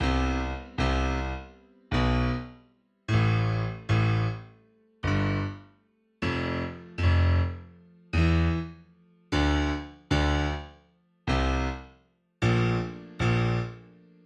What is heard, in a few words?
melody in brass, MIDI